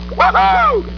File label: woohoo